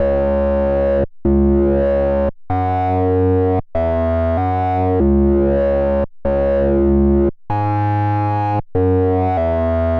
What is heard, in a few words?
chip bass